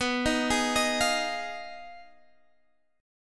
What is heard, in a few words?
F Minor 7th Flat 5th B